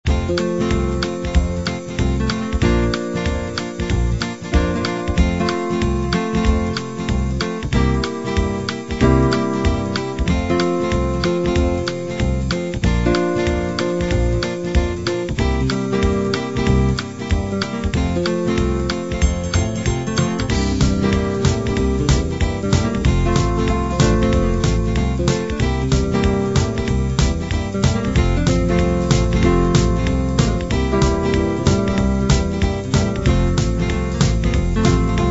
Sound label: country blues